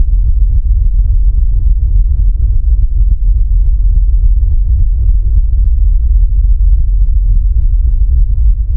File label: Big Fan Slow